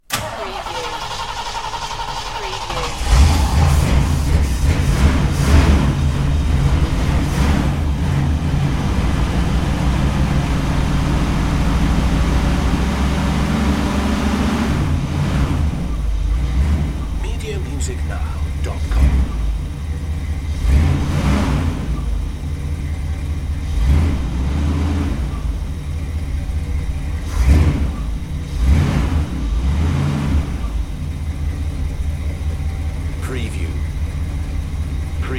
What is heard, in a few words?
1940s Engine